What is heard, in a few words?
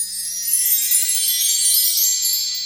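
percussion, Hip Hop and R&B Drumloops; Best Service ProSamples vol.01; AKAI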